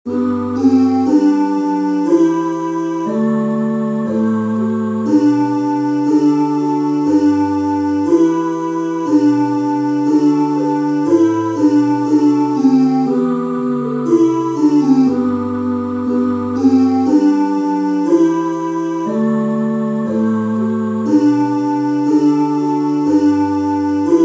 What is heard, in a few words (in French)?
Chorale Ténor; BWV1125